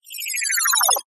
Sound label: science fiction robotic cyberpunk electronic equipment beep power; zapsplat